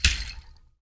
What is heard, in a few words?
HAND, Afro Shakers, Ankle Pods